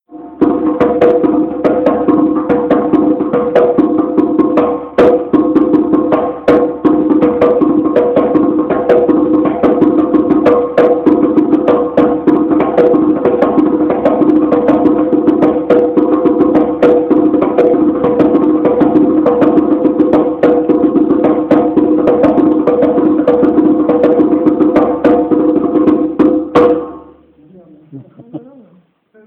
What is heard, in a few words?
Djembes